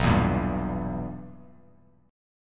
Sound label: B Major 7th Suspended 4th Sharp 5th Bass Standard, chord; BM7sus4#5; strummed; JGuitar